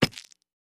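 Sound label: Flashbulb Pops With Glass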